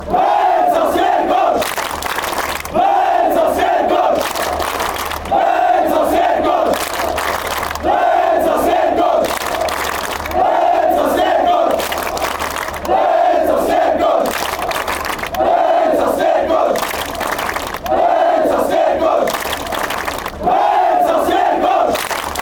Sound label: fanchants; venca sverkos